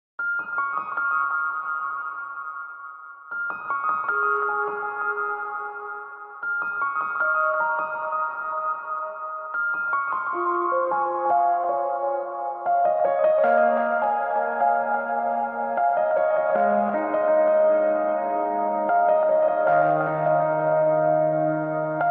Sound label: tune ringtone bgm; animal; ranveer kapoor